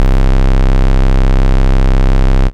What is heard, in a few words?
Basses Root C; Vengeance Samples